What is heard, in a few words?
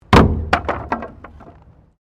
Wood Fall; Material components for constructing more complex sounds; Wood (Sound Effects:Materials)